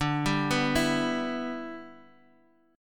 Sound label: G 6th D